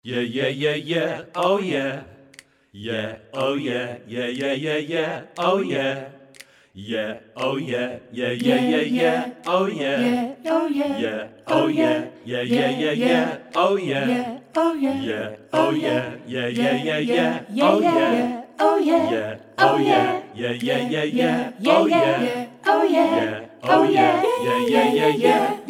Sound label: Song